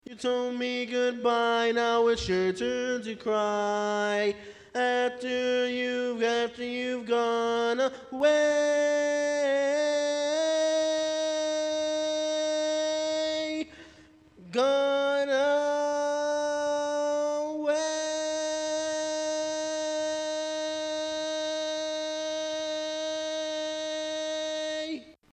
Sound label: Bari, barbershop